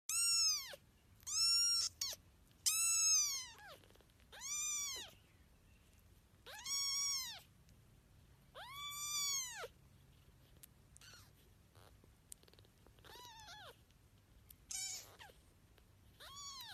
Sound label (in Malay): Anak Kucing